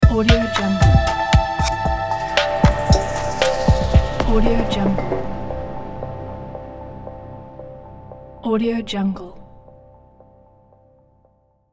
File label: modern logo